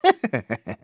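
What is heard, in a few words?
Engineer laugh evil, German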